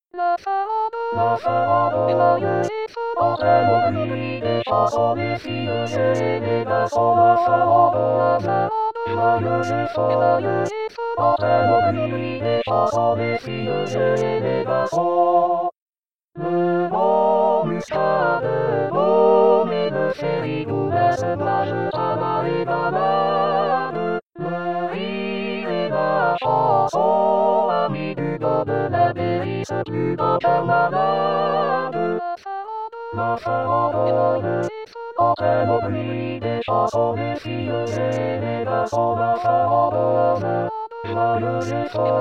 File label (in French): farandole sa1a2tb chante